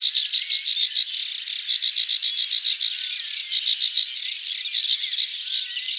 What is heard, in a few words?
Sedge Warbler